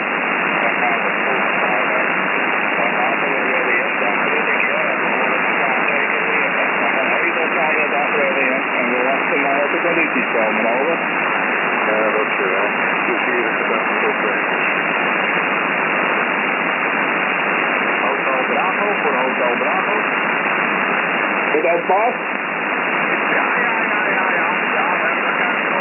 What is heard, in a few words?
80m SSB low qrm day weakest parts; 80m SSB demo weakest parts NC